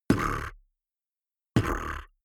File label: beatbox bass drum tongue roll damped